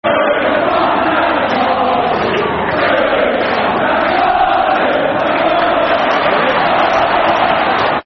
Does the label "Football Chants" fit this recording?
Yes